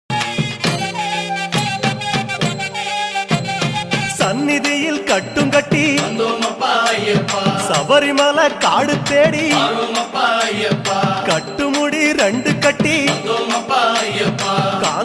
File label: songs ringtone